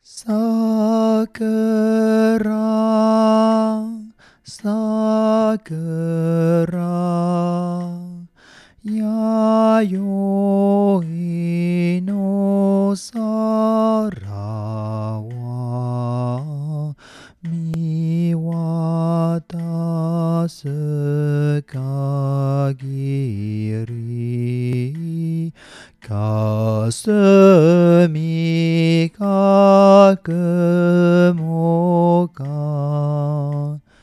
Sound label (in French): basse VOIX